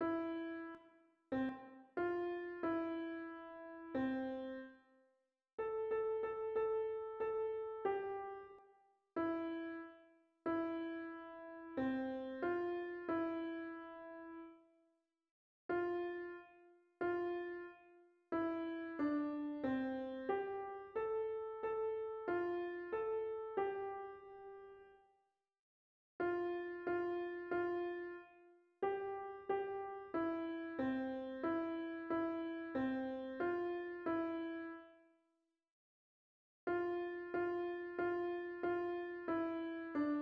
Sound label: Piano C